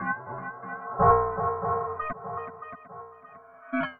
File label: Tape Arp